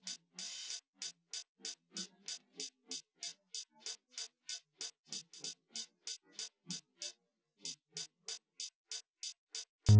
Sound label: wdrums inst sim; SASSEC07